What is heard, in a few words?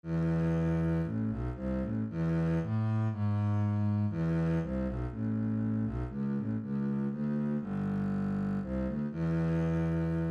Fantasia bass